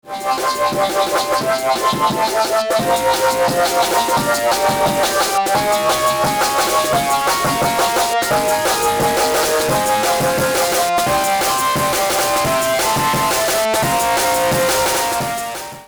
Dist und Beat; Murf

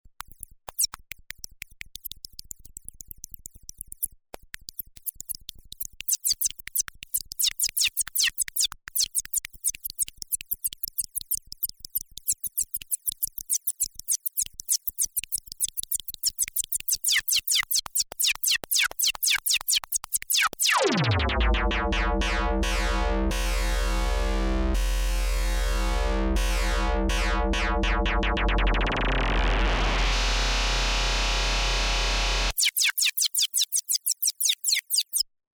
MicroFreak Envelope auf Pitch

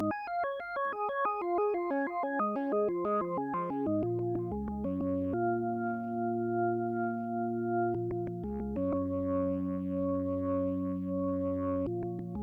TC2290 DT Orgel Original

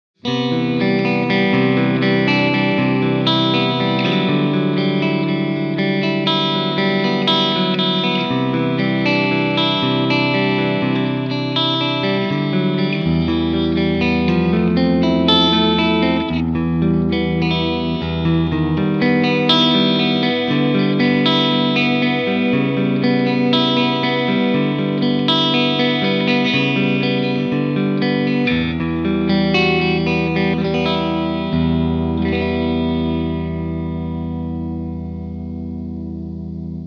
Clean beide

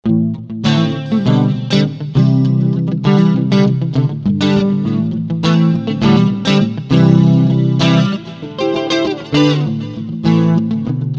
Front Singlecoil mit etwas

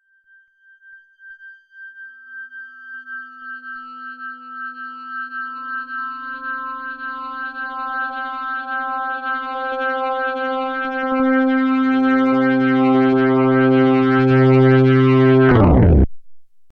b4000 schwell

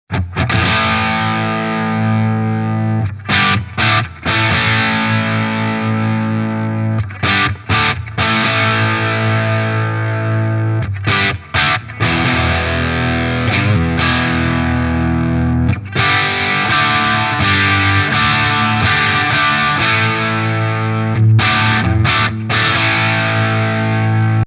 PRS HDRX 20 Head beide Kippschalter oben; Tr. Vol und Bs. Vol 3h

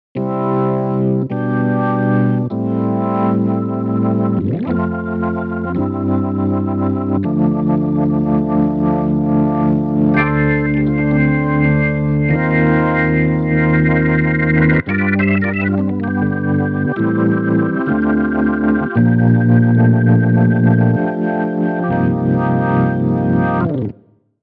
Yamaha Orgel

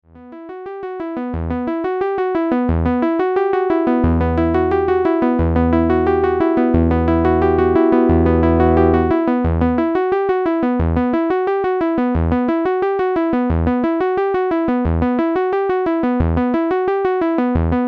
Juno 60 Arp trocken

